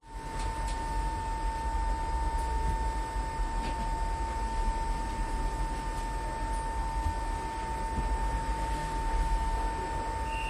train london inside atmos announcement moving and still; new cross; london music mixing